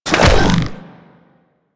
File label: Hoverboard Jump; Warframe; Vehicles; Venus; anim sounds